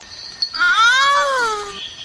frog

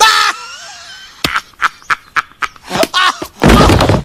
bahaha

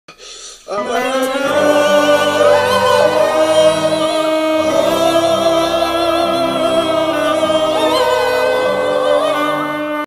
Symphony Epic Version; memefactory

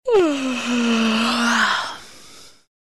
yawn

